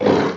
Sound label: attack; eddga